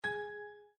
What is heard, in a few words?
Cityscape Information Bar, windows 7